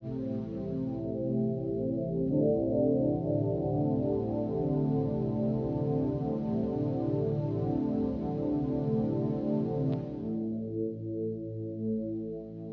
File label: SYNTHPAD ETHNO 125 A; eJay; Sphere